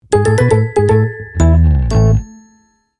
soundlogo

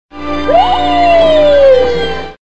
falling

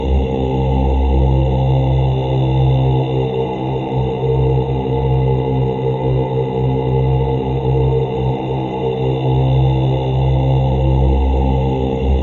anti matter bomb idle

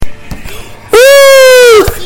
gahahahah

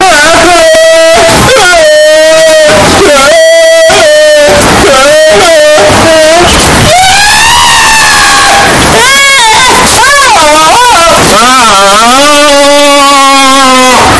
goat cry

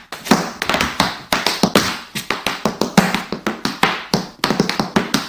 tap dance

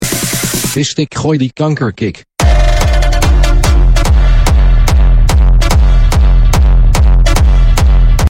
kick

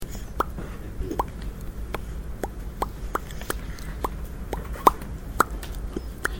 bubbles poppin

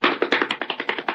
cartoon running sound

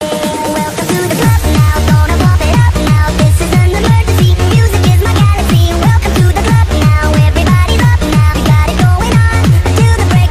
rave sound

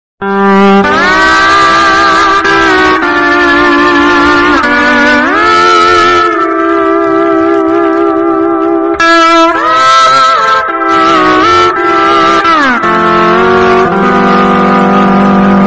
hawaiian cocktail bass boosted; spongebob

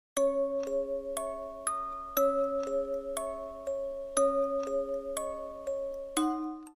horror lullaby

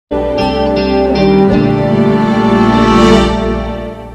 sad music; family matters

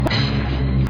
piano

